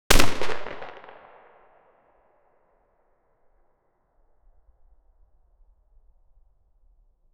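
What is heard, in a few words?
a distant 7.62x39mm gunshot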